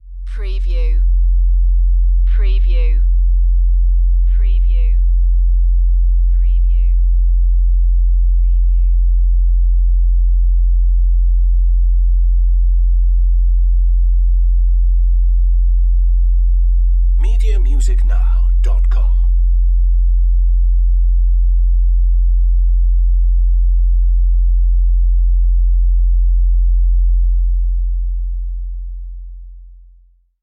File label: Low Buzz Deep